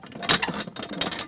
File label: valve loop; Soldier Of Fortune II Double Helix; XBOX